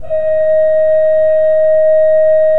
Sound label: D4, Cathedral Organ, KOPPELFLUT; Propeller Island